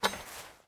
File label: Spoon drop; miside demo